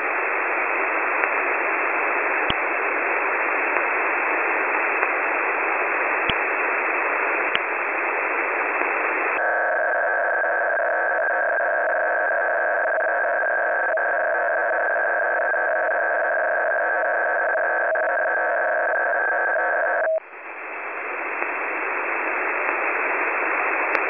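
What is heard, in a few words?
audio frequency-shift keying 400-960; 10210khz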